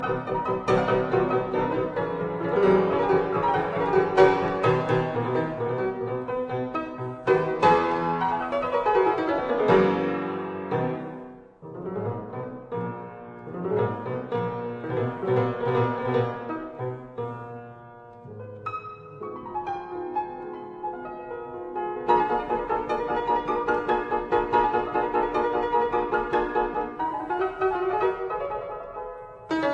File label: Piano Sonata in C major, Minuetto Allegro Trio Poco ritenuto; Op 24